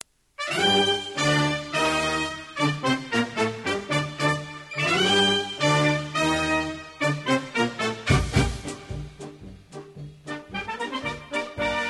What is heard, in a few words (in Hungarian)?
indulo; hungary; Batthanyi